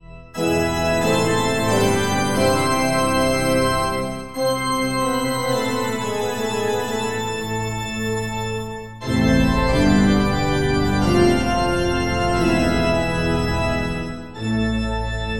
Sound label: medieval gothic organ